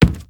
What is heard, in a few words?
Soft Wood Run